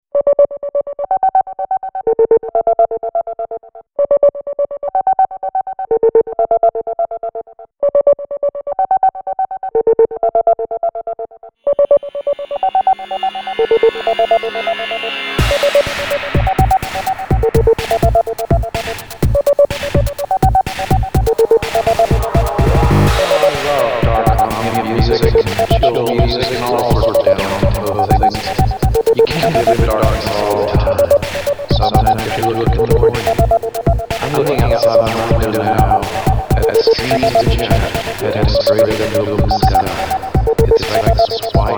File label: Electro Deluxe